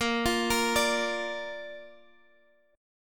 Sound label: D Minor Sharp 5th A sharp, guitar